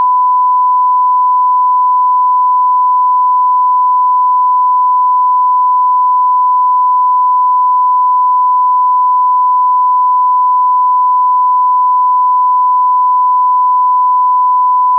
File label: sine 1khz mono